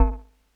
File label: Drops Kawaii Gs0; keys